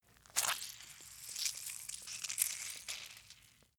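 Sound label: rag leather wring out, household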